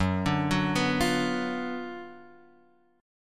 Gb Major 7th Suspended 4th Sharp 5th, Guitar, Open F; arpeggiated; x,1,2,1,2,0; JGuitar